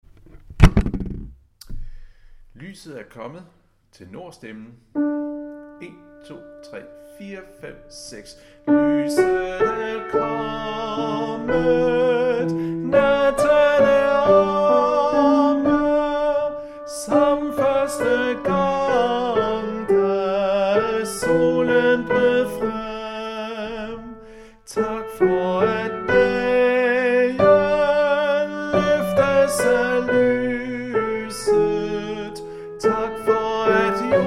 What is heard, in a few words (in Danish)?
tenor; Lyset er kommet